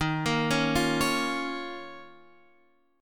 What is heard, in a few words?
D sharp 7th Suspended 2nd, Guitar, Drop D